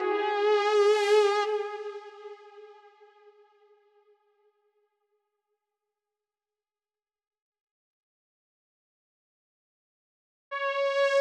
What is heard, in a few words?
Breakout Psychedelic Mix Stem Layer; Breakout Recharged